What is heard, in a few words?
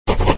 Scribble Sound